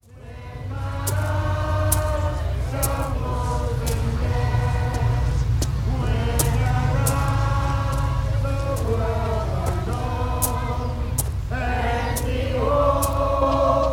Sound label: Gospel